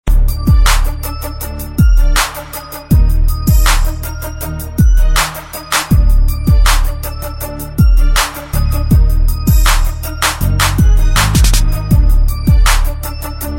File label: Hip Hop Beat